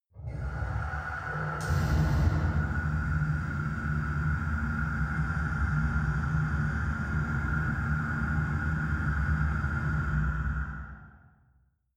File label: mechanic ambience